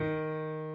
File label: key